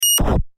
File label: neo arcade movement